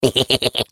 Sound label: laugh, fr; Defective Turret; sp sabotage factory defect